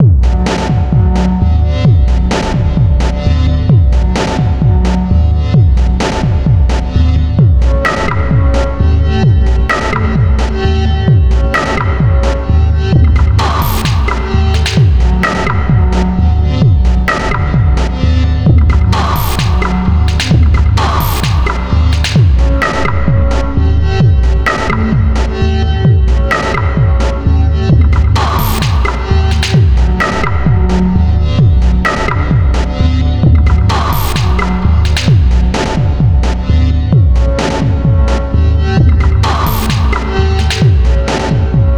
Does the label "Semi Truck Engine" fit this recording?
No